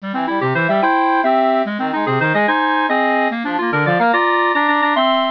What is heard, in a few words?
stage clear; mario